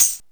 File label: SP1200, DRUMMACHINES